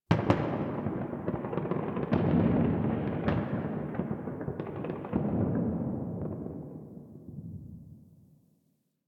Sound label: Ambient Distant Explosions; Nineteen Ninety Nine